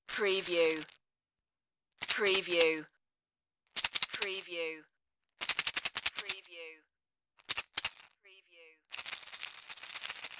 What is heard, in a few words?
shake box of pills